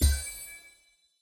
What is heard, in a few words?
scatter Hit; wilderland